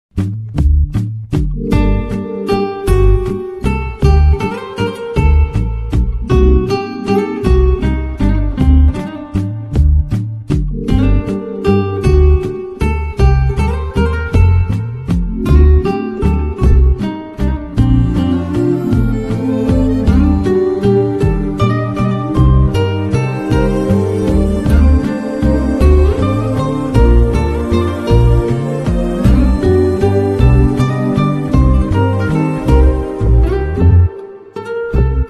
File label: BGM Cute Dubsmash Ringtone; ENNU NINTE MOIDEEN